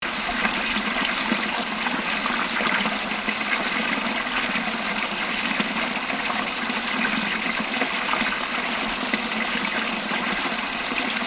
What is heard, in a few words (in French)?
Mini cascade